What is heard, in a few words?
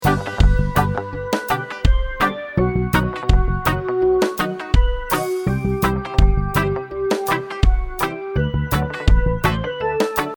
reggae